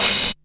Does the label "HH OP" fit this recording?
Yes